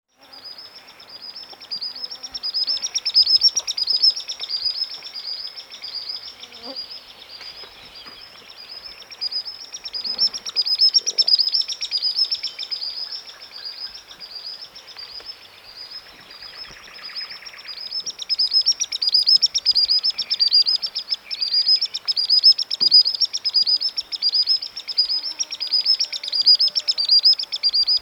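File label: perevozchik golos, common sandpiper voice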